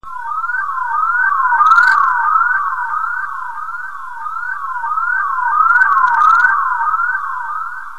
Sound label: jungle